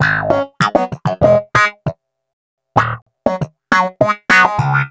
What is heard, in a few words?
Wah Snap G# Rockin, Snap Bass; Basses